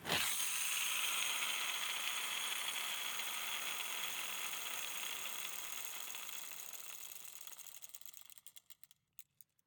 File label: Roller skates, old fashioned, all metal, wooden wheels, swipe, spinning, slow to stop, lingering, wide; Eiravaein Works; Vaeyan IV